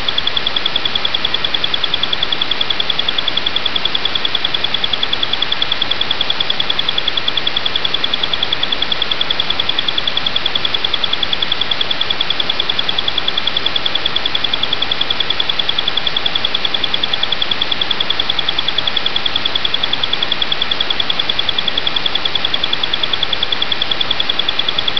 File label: car alarm receiver